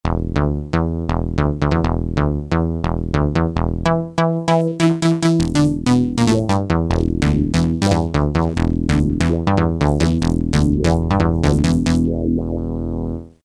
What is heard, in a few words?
TB-303 Bs; Roland M-DC1